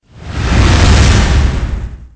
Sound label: wind